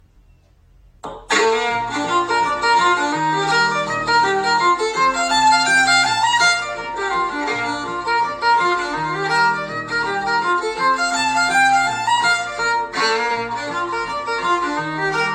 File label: Topeka Polka 75 percent tempo